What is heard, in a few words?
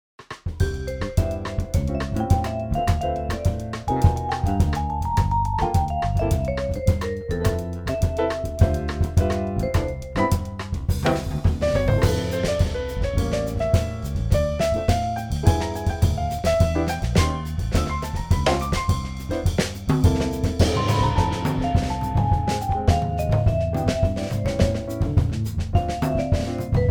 casual bossa, jazz kit; oneshot jazz kit; klevgraend produktion